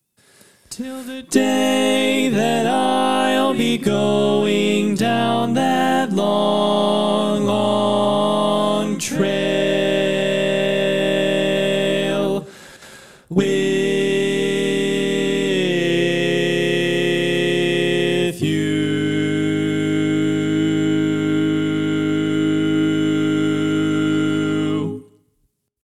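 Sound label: Baritone, barbershop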